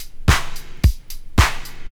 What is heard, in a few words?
house loop